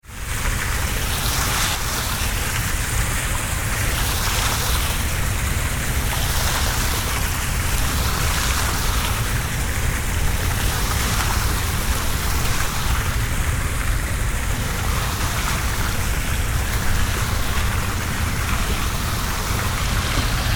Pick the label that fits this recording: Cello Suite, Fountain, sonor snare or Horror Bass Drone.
Fountain